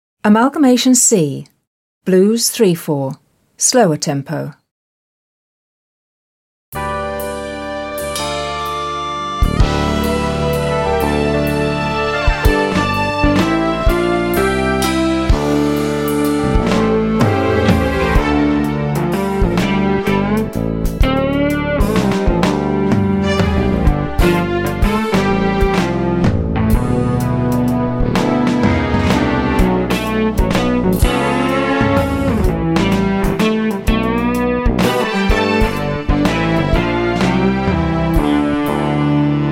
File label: Blues 34 - Slower